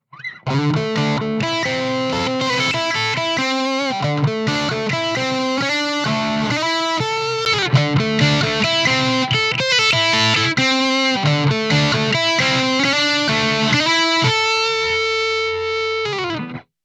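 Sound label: Electric Guitar Distortion; ElectricGrt Dist 1; Solid State Logic 611EQ serie API-500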